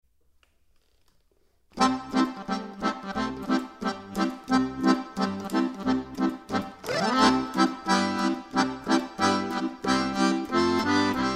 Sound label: jinglebell